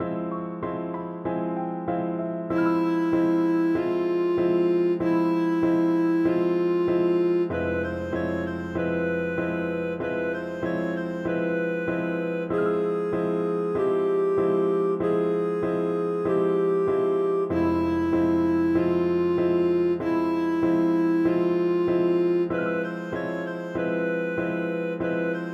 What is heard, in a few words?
Song and Dance; MTWFC VOL2 Parts1&2 Audio Accompaniments